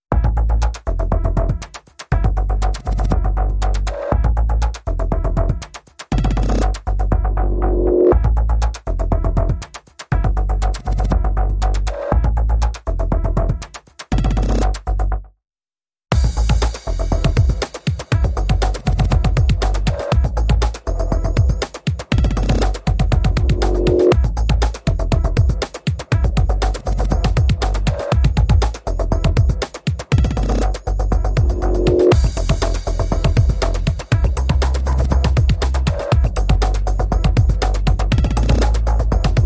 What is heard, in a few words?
Drums and Bass Mix